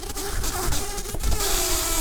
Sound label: bumblebees, Pascuorum queens